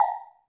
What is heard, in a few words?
Dance Kit, Korg 01W